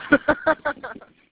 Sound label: laugh